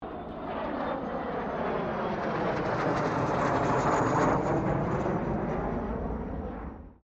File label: AIRCRAFT CA-27 SABRE PASS SLOW; CA-27/F-86 Sabre; Airplane Sound Effects; Airplanes (Sound Effects:Vehicles)